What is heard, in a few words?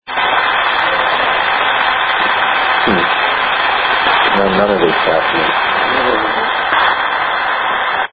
man moans at 6 or 7 seconds; EVP